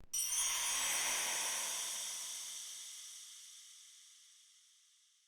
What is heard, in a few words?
Music Mini Game Success; Player Instrument